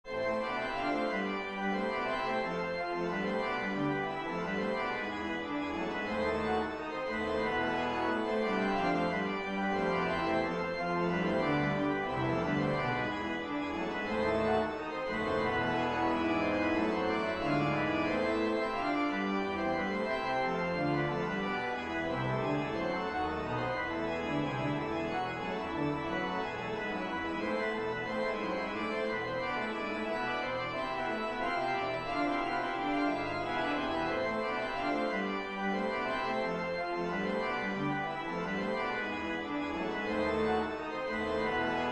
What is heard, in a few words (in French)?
piece pour orgue